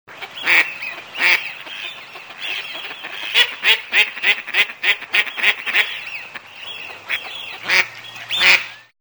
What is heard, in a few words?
anas platyrhynchos